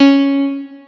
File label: piano